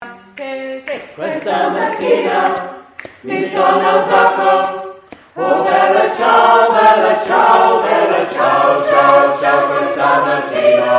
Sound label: bella ciao